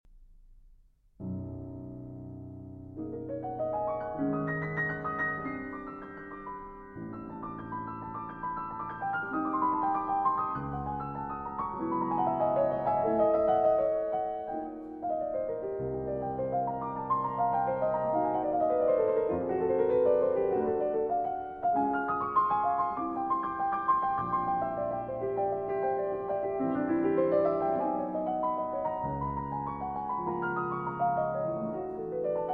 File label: Concert Etude, Remembrance Larghetto; Eight Concert Etudes, Op. 40 IV.